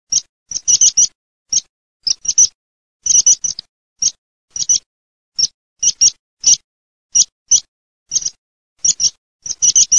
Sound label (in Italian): pispola pispola